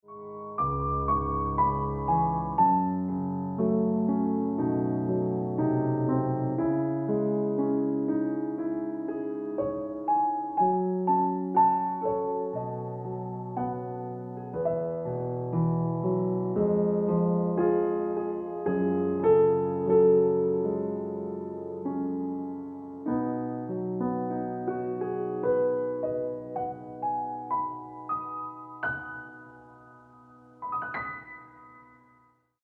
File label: Ringtone Piano Music